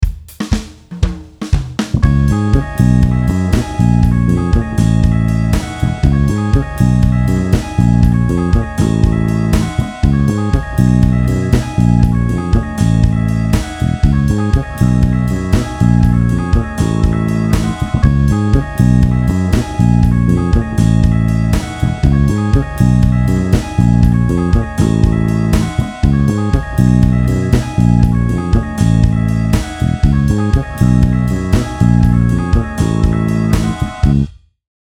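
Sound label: Open A and Fretted A, bass; Yamaha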